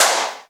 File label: DANCE CLAPZ; Big Fish Audio; Synth City